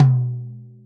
TOM

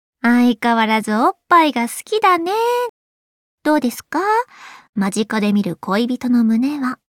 applause

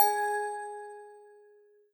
bell

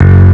bass; osu

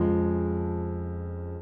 piano low

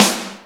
normal hit clap

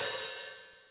Hihat Open

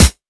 drum hit normal; osu

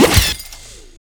combo break

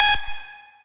lead

